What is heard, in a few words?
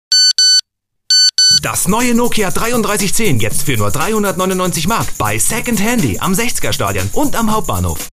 Nokia 3310